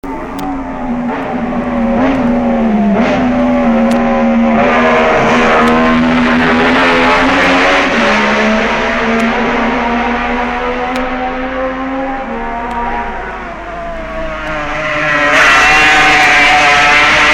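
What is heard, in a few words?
motorcycle; 1965 Agostini Reid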